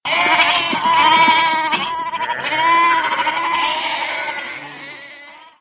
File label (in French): chèvres